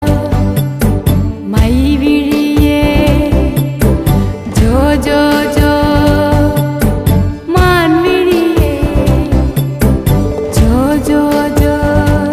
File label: TAMIL SONG RINGTONE